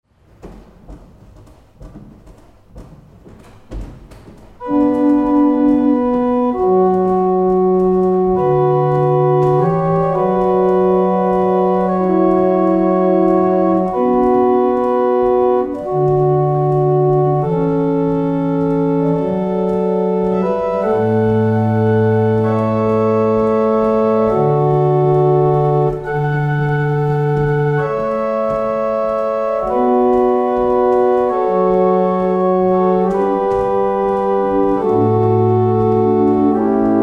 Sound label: barrel organ; Letheringsett